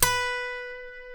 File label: C#, funk guitars; Spectrasonics; Hans Zimmer Guitars VOL-1; TAKA FUNK